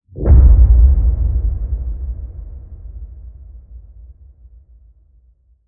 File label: Sub Hit